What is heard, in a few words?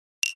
Mech Button Light, switches